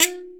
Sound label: Kalimba F#3; Planet Earth; Digital Sound Factory Planet Earth